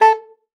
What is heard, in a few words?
A4, alto sax; Xsample VOL-2